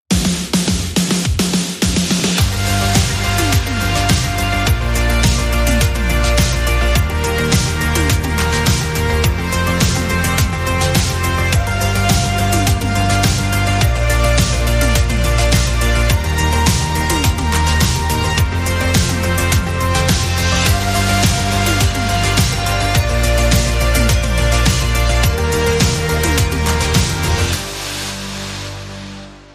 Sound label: Arpeggio Flight